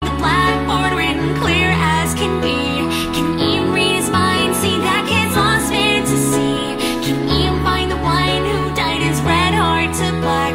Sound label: Lost ones weeping